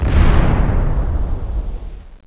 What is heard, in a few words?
Rocket Launch; Nuclear Hammer